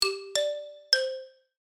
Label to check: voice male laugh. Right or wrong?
Wrong